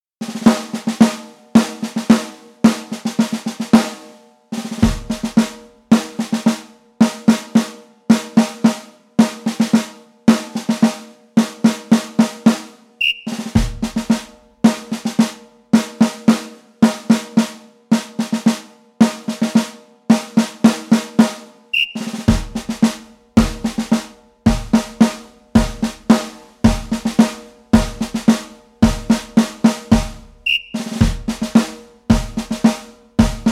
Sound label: Marsch (Playback)